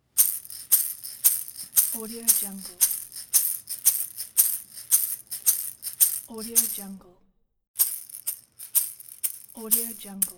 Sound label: shaker